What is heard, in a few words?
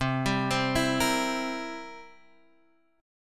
B sharp 7th B sharp; arpeggiated; JGuitar